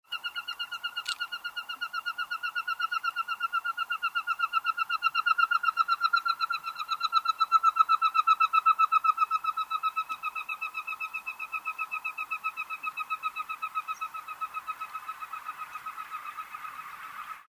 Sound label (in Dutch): Brilduiker Bucephala clangula